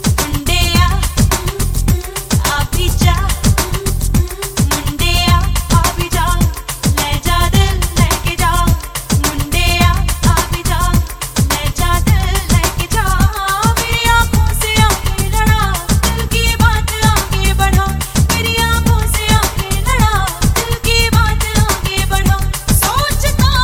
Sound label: hindi song, ringtone; shaadi se pehle 2006